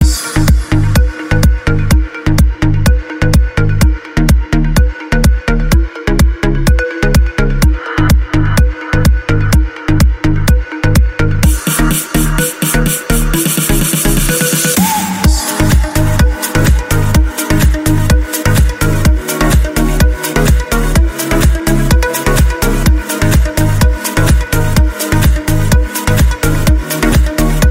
Xiaomi Note 6 Pro, ringtone

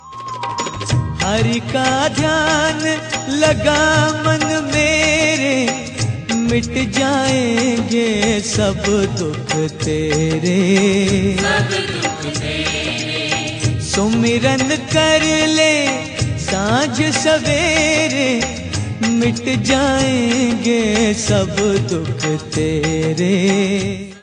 bhakti bhajan

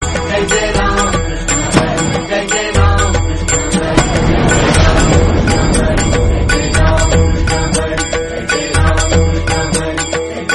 marathi god, ringtone

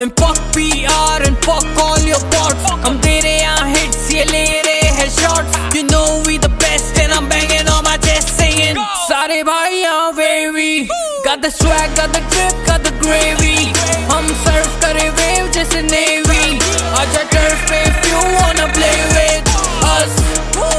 INDIAN HIP HOP CYPHER

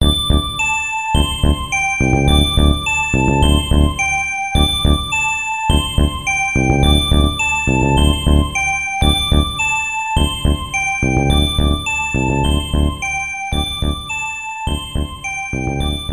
iphone message tone

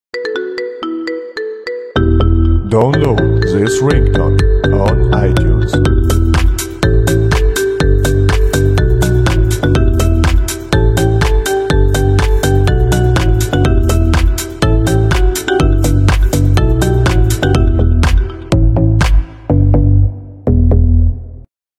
Marimba

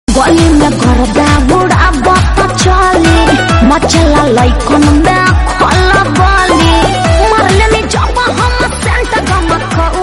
Bhojpuri, ringtone

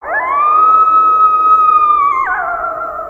Coyote Howling

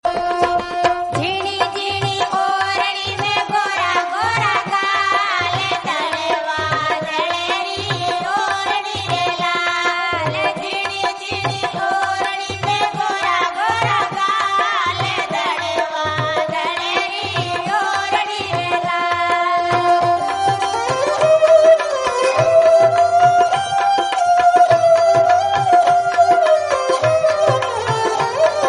Rajasthani, ringtone

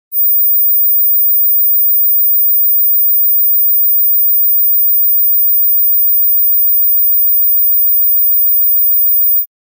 silent, ringtone

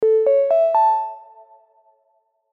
simple notification

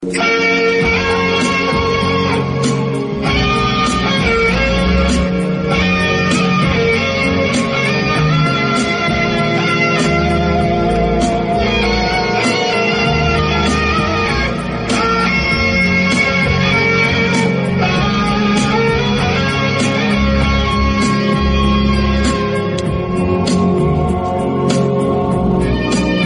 rockstar solo, ringtone

